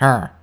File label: grunt